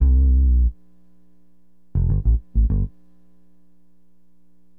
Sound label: BASS, Acid Jazz Funk